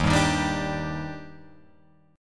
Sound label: Eb Major 7th, Guitar, Open F